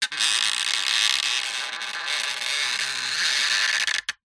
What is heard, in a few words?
foley wood balustrade turn squeak; zapsplat